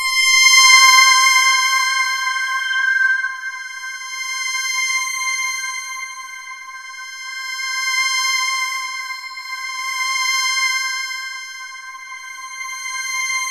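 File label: DEEP ATMOS, Kurzweil K2000; BASIC-KW2000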